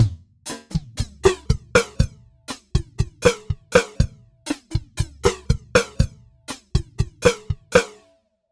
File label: Flanger Drums Envelope Follow